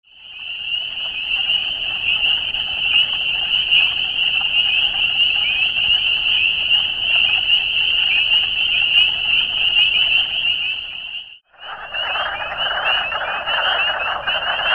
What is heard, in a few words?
peeper combo